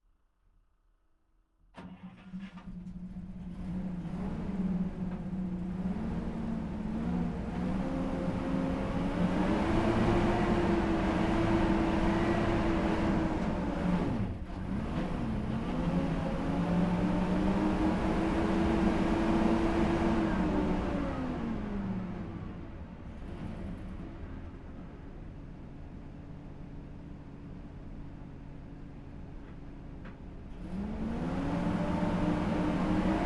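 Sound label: brdm2 onboard start fast drive stop off engine right; mkh8020; Sonniss GDC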